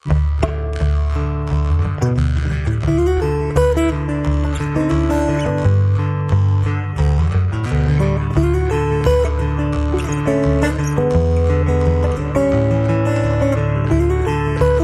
Grampas Old Guitar

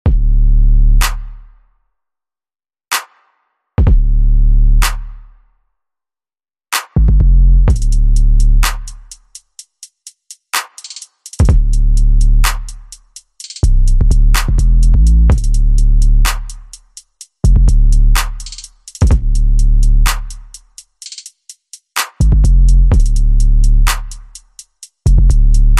Drums and Bass Only

